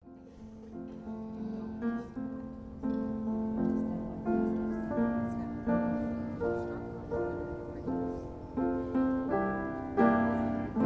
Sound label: halleluhallelu children's choir